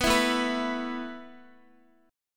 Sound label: A Suspended 2nd A Guitar; Asus2; strummed; x,x,9,9,9,6